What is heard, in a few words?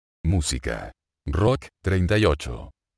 rock